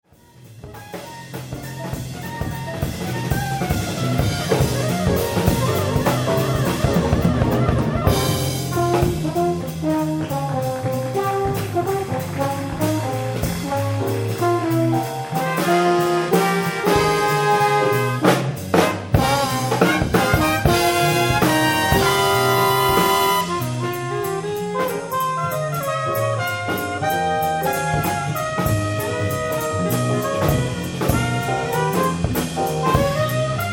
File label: Duet